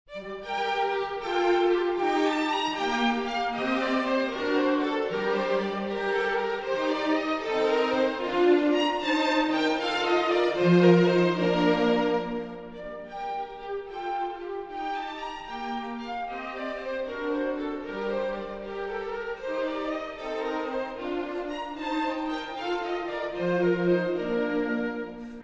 Water Music Suite No. 3 in G major - Country Dance; HANDEL, Georg Frideric; The Water Music Suites